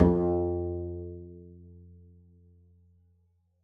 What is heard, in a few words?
pizz f2, double bass; dsmolken